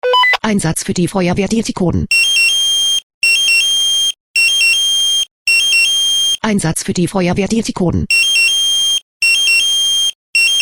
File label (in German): SMS Alarmierungstone; FW Dietikon